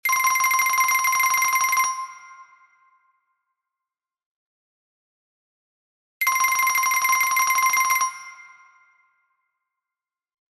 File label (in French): Telephone japonais